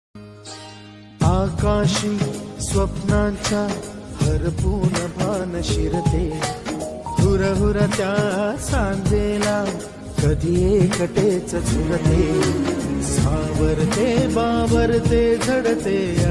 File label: short song